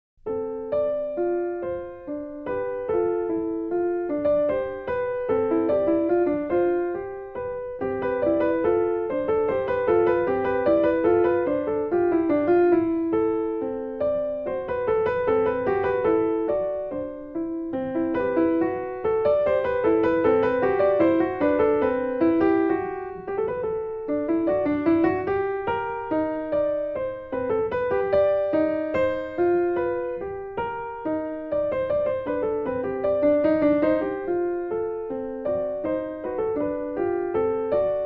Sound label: 5 Finger Piece For Piano